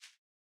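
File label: Footstep Grass; Boomerang Fu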